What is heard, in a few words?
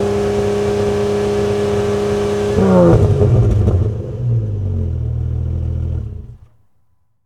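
Truck Cherokee Fast Off; SFX Kit; Trucks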